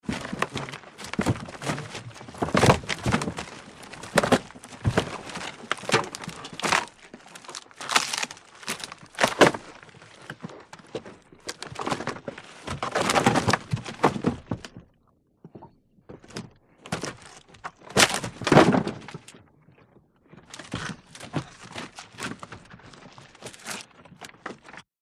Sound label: Wood Wrench Break Rot